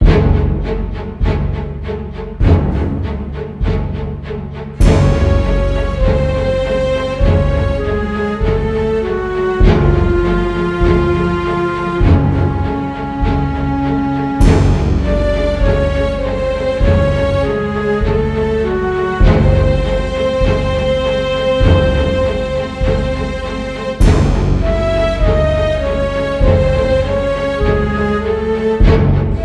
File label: Classical Murder